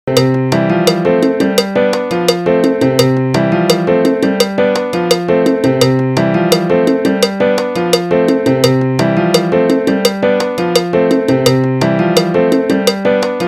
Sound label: piano conga cowbell